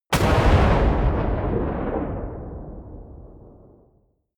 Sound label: missile large fire helicopter distant